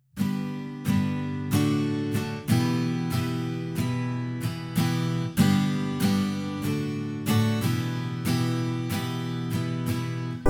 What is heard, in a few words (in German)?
Applaus Applaus